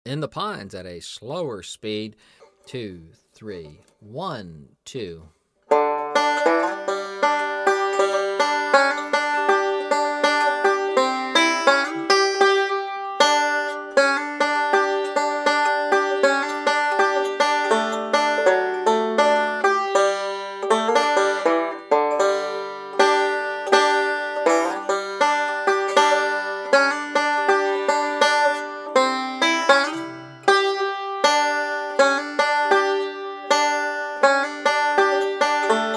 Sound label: 78 Bpm; In The Pines Breaks